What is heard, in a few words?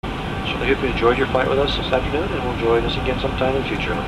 flight